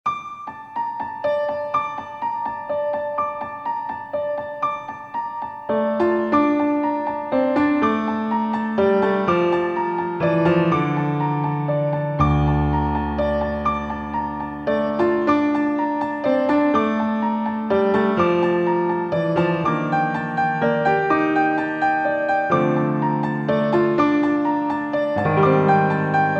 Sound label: Piano Playing; Main Theme Variation